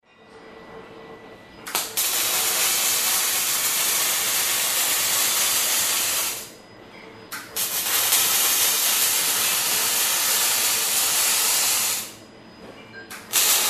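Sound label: electric welding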